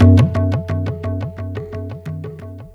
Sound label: Dub Tabla + FX; Sonic Foundry (Sony Creative Software); Ambient Grooves; Dub Hits